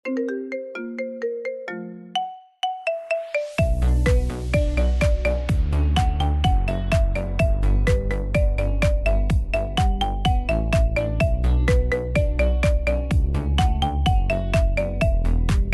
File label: Marimba Remix